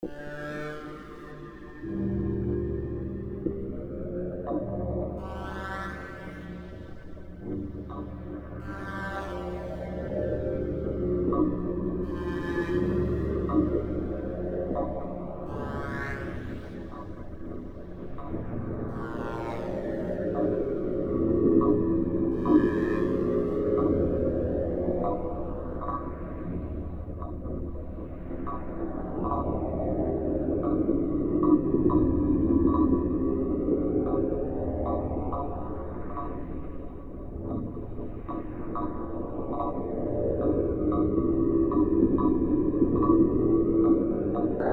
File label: dark ambient